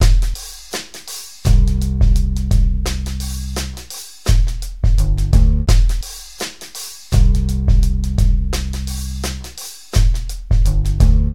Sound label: Jazz Drums